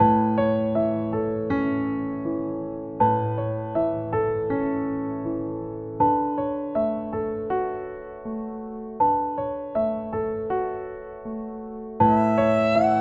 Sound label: beat